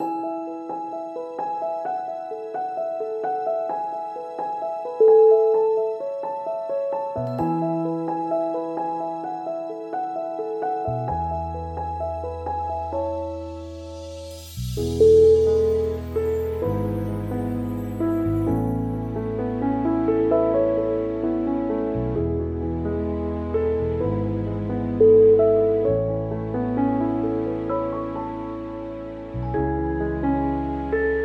touching and intimate

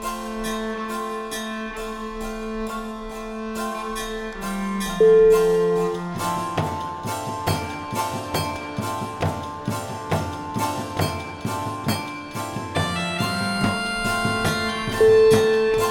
motivational bagpipes

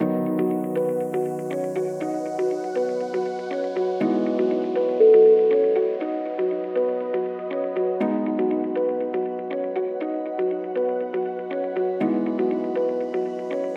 inspirational ambient

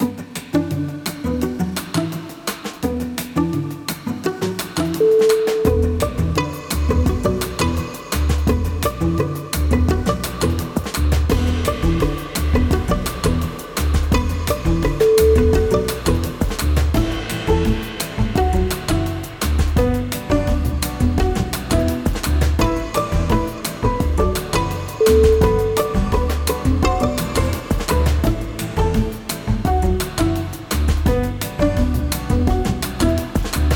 happy bossa

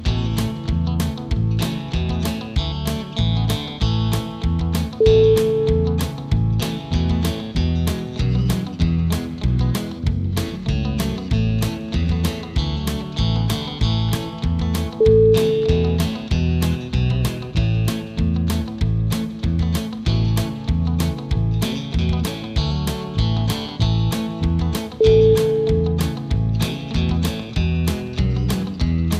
twang happy

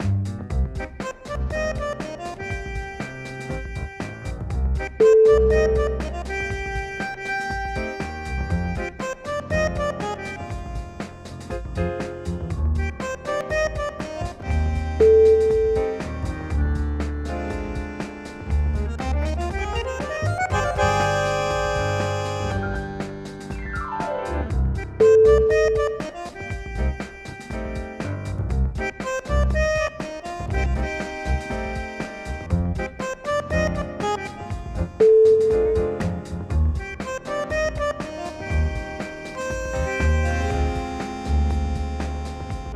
tango

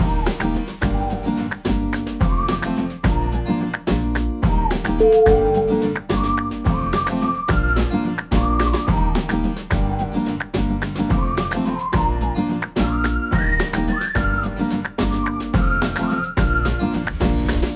feel good vibe

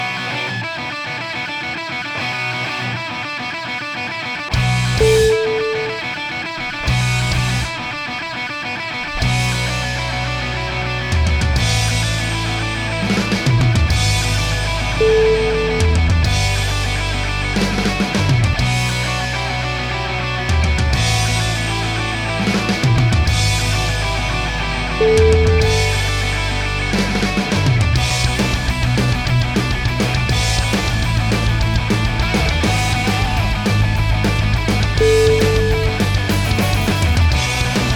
fast metal

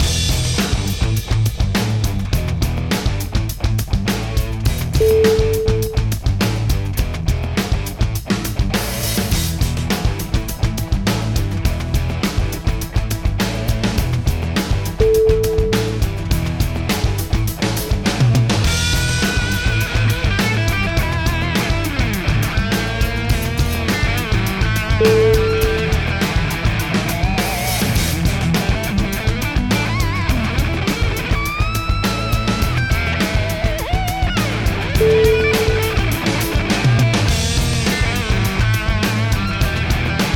nu rock drive

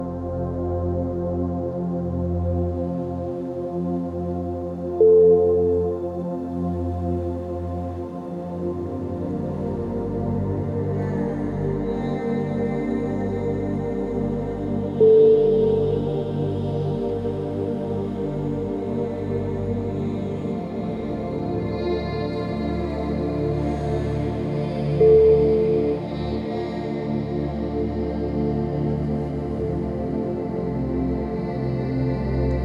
deep inner mantra

